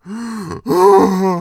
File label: alert; slender; zombiebm